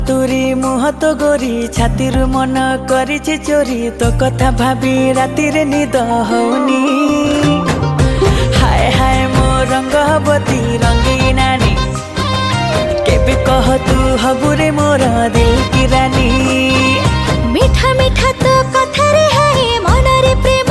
New Odia Song

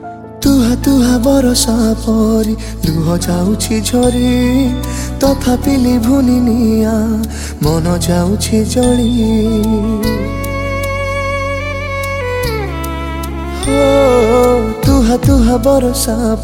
New Odia Sad Song

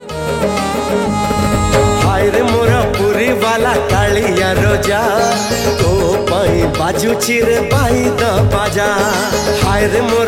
Odia New Jagannath Bhajan Song

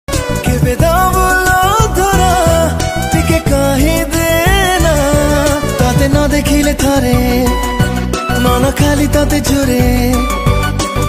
Odia Romantic

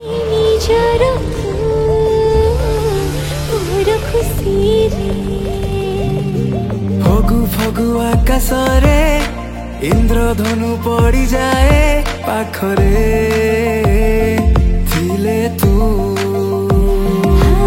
Romantic Love Song

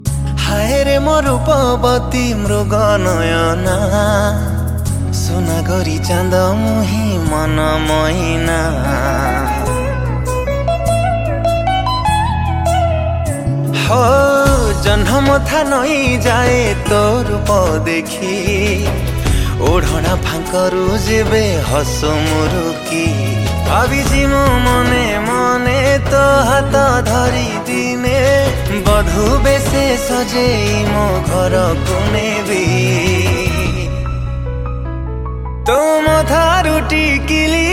studio version, Odia

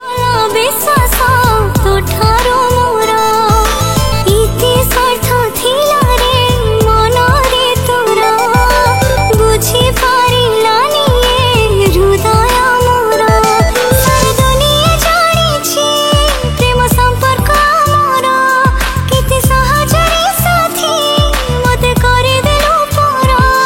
Odia Sad

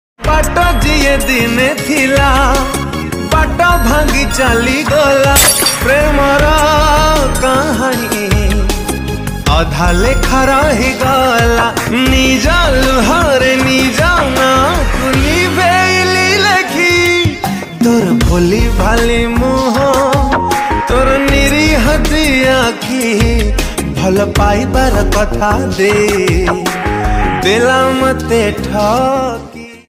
New Odia Romantic

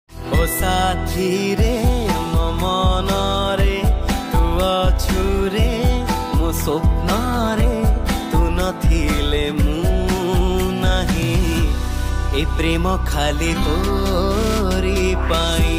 Odia Romantic, Odia Love, Odia Ringtone

